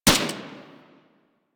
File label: Dual Soma Fire 2D A